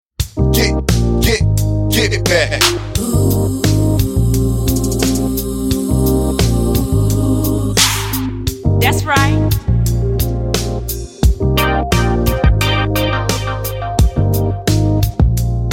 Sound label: Chill Mix